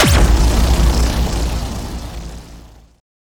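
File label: Sci weapon Shot Beam Large Industrial Grinder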